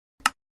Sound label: button; minecraft